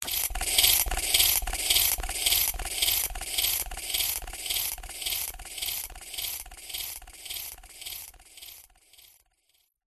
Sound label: alien pump device chatter futuristic mobile app pick up sci fi mechanical glitch; epic stock media